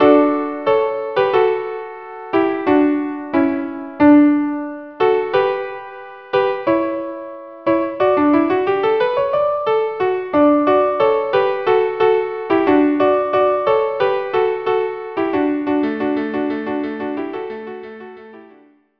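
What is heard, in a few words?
violin duo; joy to the world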